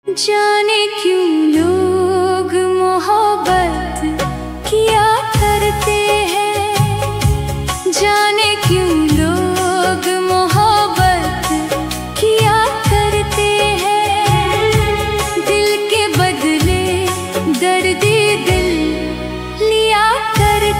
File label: AI Ringtones